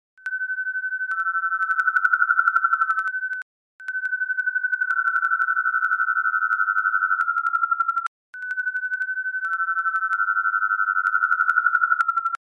phase sample

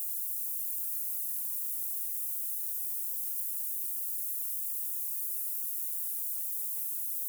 31550khz gfsk-16000bps

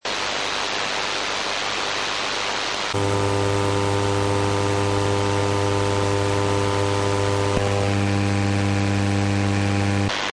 noise ssb am

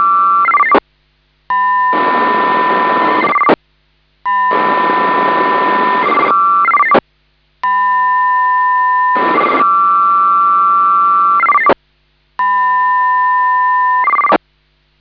scanner

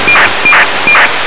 ACARS

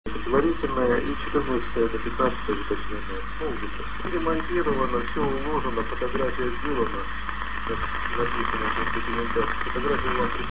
ISS

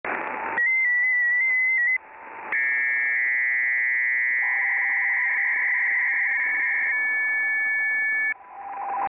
psk500; 3590khz

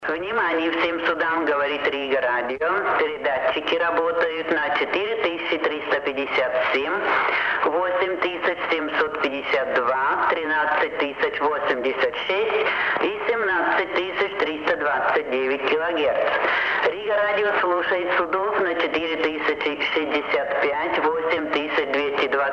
riga radio 8752 khz usb

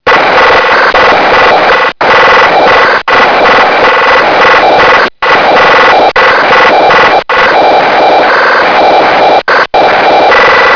signal

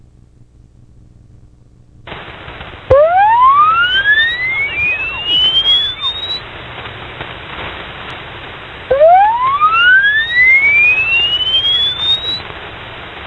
harp

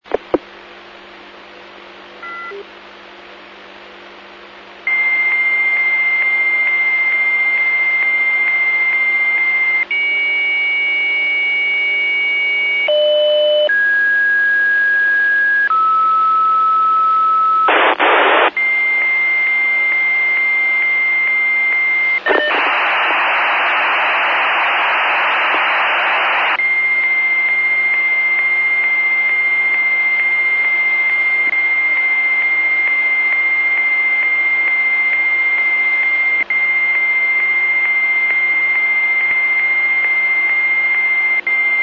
modem

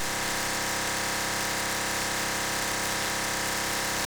DMR NXDN

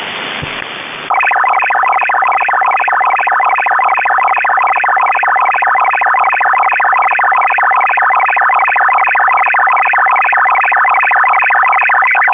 8195khz usb

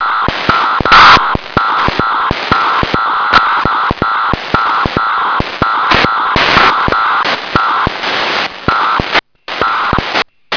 signal

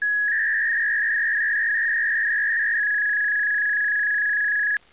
test rtty

